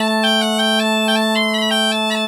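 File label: bagpipe 160, Celtic Flavours; USB Soundscan vol.42; AKAI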